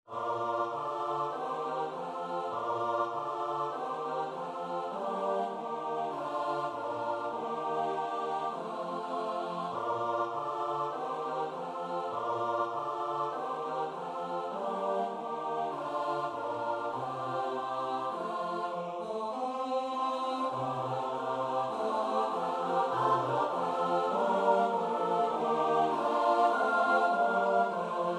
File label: midi; bija dzwony